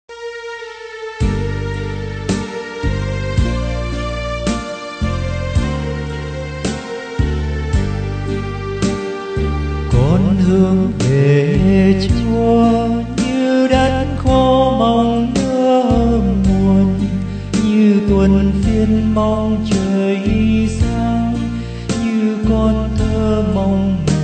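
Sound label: soprano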